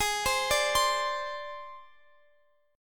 Ab Major Flat 5th Ab, Guitar Open F; arpeggiated; x,x,20,19,17,19; down

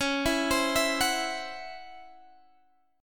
C sharp Minor Major 11th, Guitar, Drop D

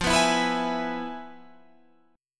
F Minor Major 7th Flat 5th Guitar Drop D

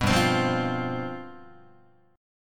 G 11th, Guitar; G11 strummed

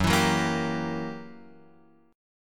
F sharp 7th Flat 9th Guitar Open F, chord; strummed; JGuitar

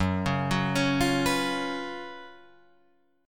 F sharp Major 7th Suspended 4th, Guitar, Drop D; chordshape; down

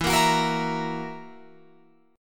E Suspended 2nd Flat 5th E down Guitar Open F; strummed; JGuitar

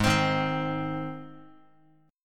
G sharp Major G sharp Guitar, chord; strummed; JGuitar

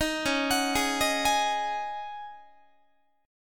Db Suspended 2nd Suspended 4th Db Guitar; arpeggiated; JGuitar

